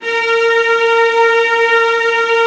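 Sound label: VIOLAS C5, Strings; ILIO; Synclavier Strings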